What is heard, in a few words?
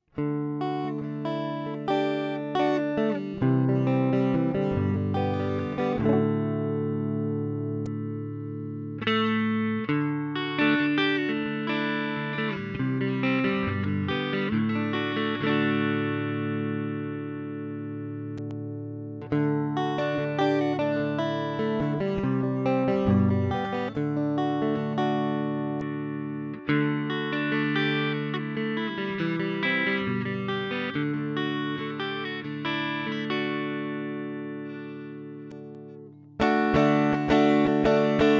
fender bassman style